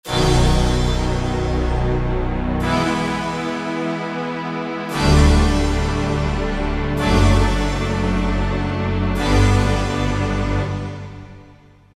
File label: Touch Orch, Kurzweil K2000; Kurzweil K2000 Value Pack; Extra Programs; ORCH1X